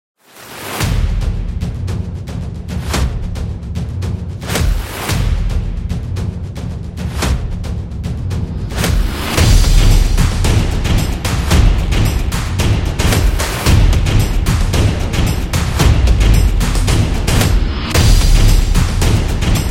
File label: Tension; monster attack